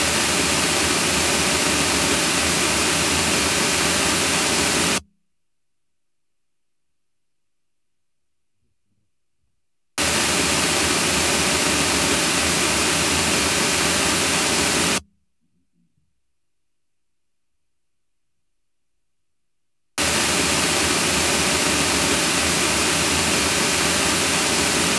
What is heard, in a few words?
pink noise reverberation kabina; diktorskie kabiny